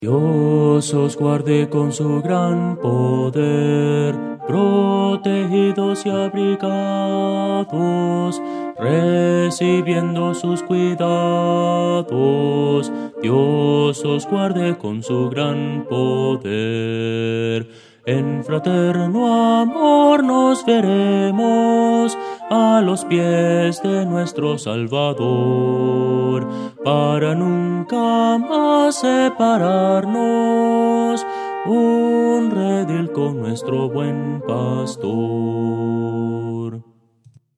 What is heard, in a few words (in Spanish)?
melodia, himnos cristianos